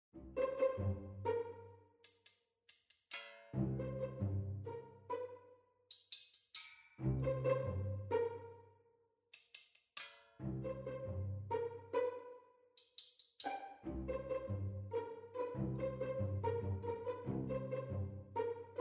plinko bg

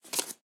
wood tile drop

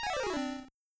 disappear

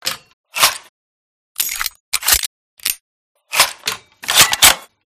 pkp reload, guns; resurviv

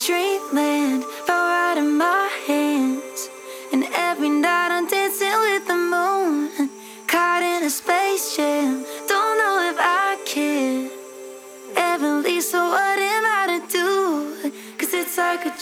LEAD

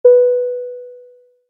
start round

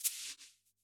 brush, jazz

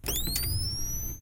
flash start

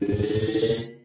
spell

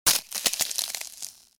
freeze; ThreeHeadedDragon

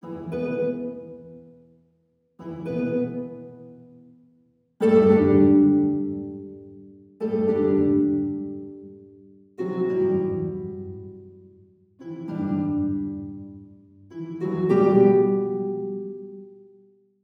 loop harp

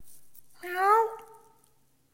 meows

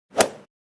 golf ball hit

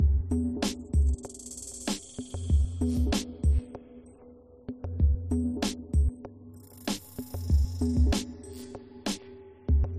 club music

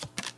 flip

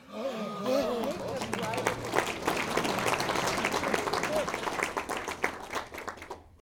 crowd Reaction guild small Reveal; spellbound

